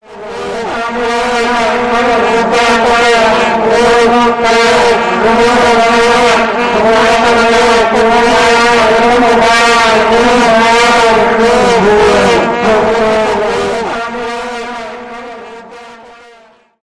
vuvuzela orchestra

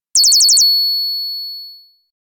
ringing chirp; standard ringtones

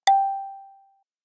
light, notification sounds

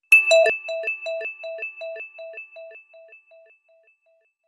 engaged; wake-up tones

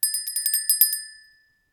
another hand bell; christmas ringtones

